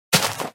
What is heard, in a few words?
grass tnt place